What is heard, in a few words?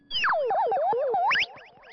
Sonic Warble